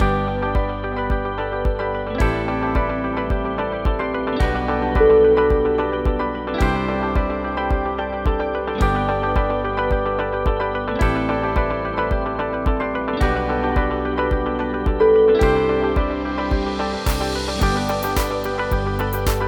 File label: uplifting